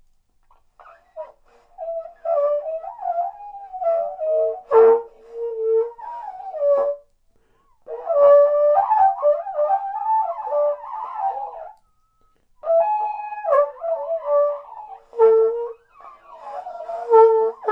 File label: bird